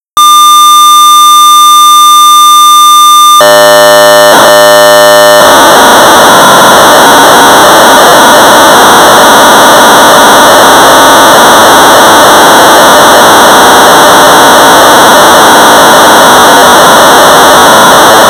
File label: BASIC Sorcerer 1200baud; Breakout; Ray Bannon